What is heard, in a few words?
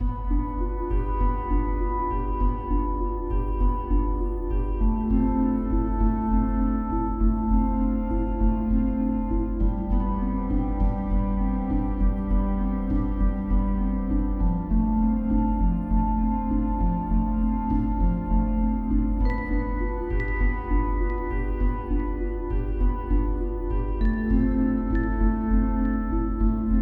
Mysterious Harp; Lost Ruins